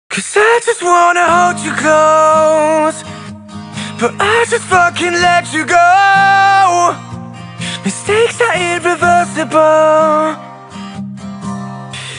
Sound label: Pop Rock